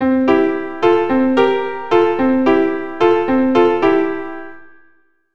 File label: Blues RH C, keyboard